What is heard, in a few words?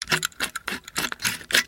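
amateur knife sharpening the old-fashioned way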